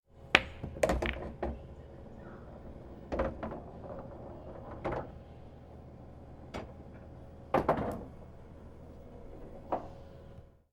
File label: pool table shot and sink